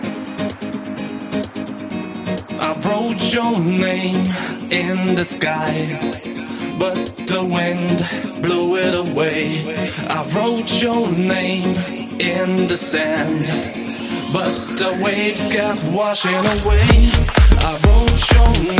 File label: romantic guitar